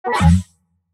Educational kids game menu ui clear

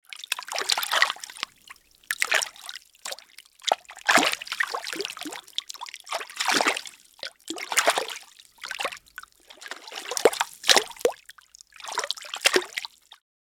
Water movement in bathtub

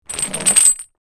Lifting metal chains off floor pickup

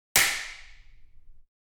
Puilli sticks single

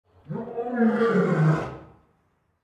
Lion roar strained and long

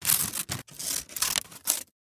Scissors cutting wrapping paper

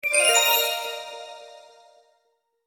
Puzzle game power up achievement; level up

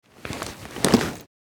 Cloth bag open

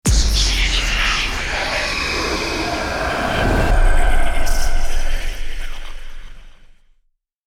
Scary whispers whoosh transition; suspense

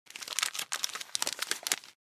Uncoated paper handling

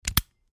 Large metal padlock open click; locks keys

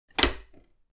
Steam lever machinery mechanical pull turn off

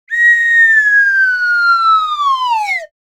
Cartoon falling down whistle

